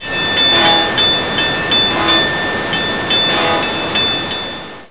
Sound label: ship